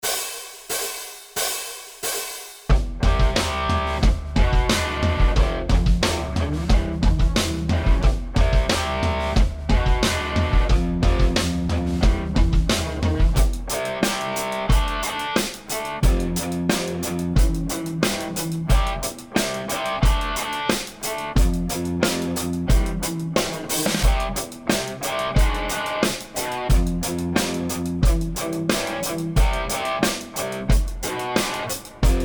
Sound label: Slide C Hm6 F E Backing Track